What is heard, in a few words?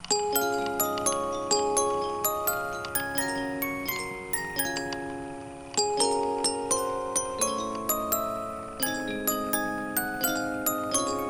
Boxy Jingle; Project Playtime